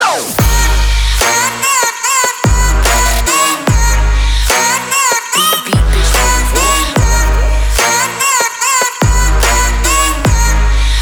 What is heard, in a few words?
remix ringtone